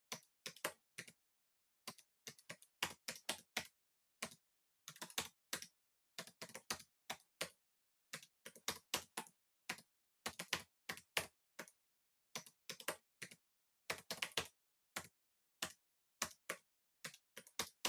PC Keyboard Far Mid

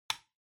Light Switch Box Rocker Dry Mid

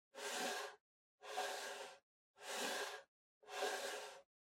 PC-Mouse-Move (Far-Wood-Long)

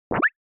Motion Pop

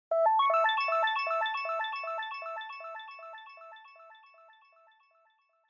Multi Accent Low

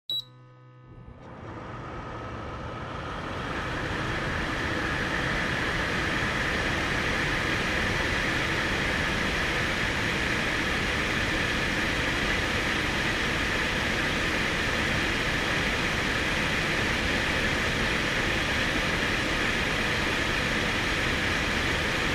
Air Purifier High

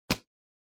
Sensu Open

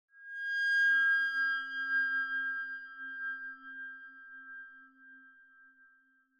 Horror Accent, Low

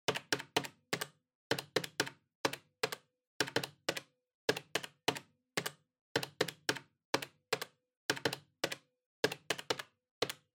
Calculator (Pattern)

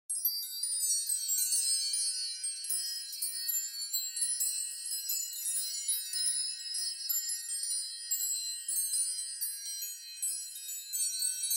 Onoma Sparkle Long; オノマトペ キラキラ

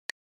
Smartphone Typing Single

